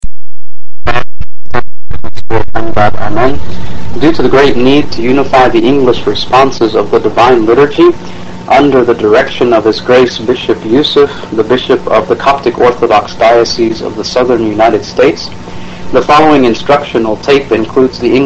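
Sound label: English liturgical hymns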